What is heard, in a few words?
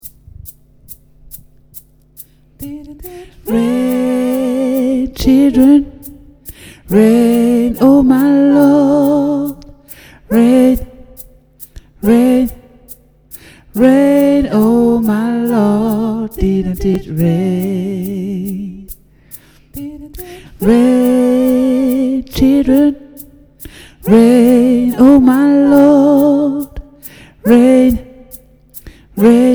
Tenor, Gospel; Didnt it rain